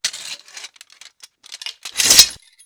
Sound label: Inf Weapons Foley; Red Orchestra Ostfront 41-45